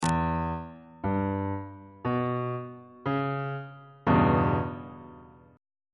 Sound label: e minor 6th chord on bass clef